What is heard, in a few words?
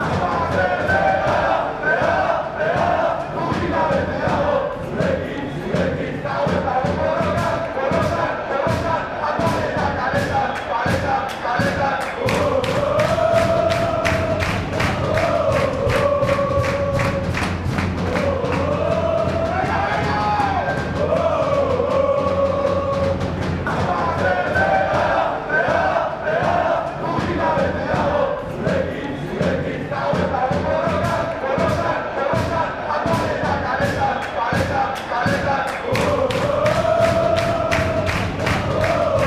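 goazen reala, football chant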